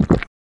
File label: Swallow; Lewd SFX